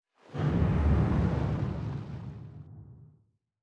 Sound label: damage vehicle; World Of Warships